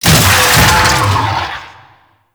Melee Knock Down; Warframe; Necramech

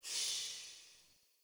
UI Progression Category Fade In

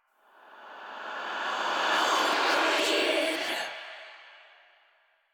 Cave Whispers; Orokin; Warframe; Ambience; Stalker

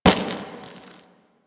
Glass Bullet Impact

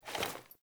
Foley Action Melee Swipe, Default Clothing

